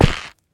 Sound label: step, stem; Minecraft